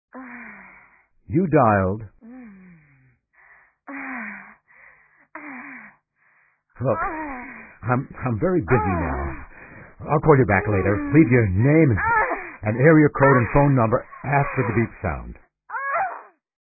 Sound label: Heavy Breather